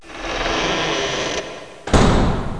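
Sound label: next door; Sexy Dolls 5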